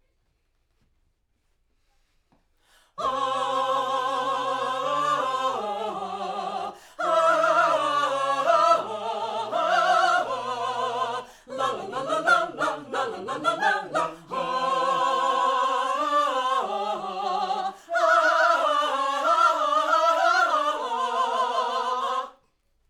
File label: Backs; SCHOEPS